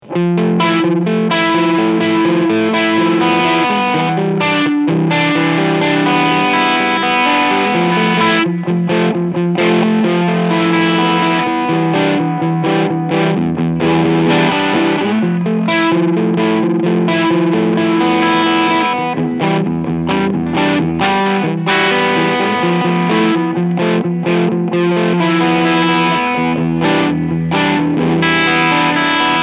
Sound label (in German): MXR Timmy Switch Rechts symmetrical Clipping HALF Gain